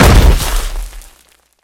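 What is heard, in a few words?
close loud squelching jump into water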